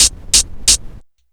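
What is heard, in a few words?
CABASS LOOP, percussion kit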